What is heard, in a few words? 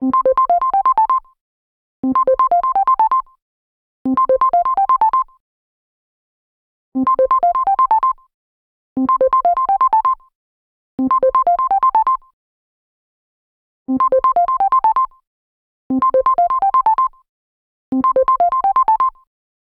Simple Bell; LG